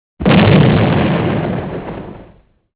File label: Explosion